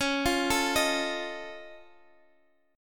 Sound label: F 7th; F7/C#; x,x,11,10,10,11; arpeggiated